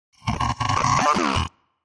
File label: Alien Vocal Sound, aliens